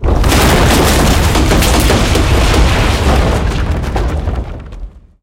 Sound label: tanks explosion wood; explosions; War Thunder